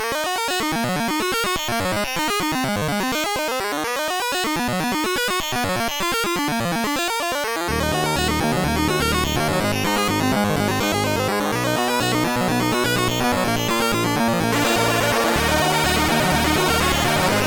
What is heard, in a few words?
mod boombass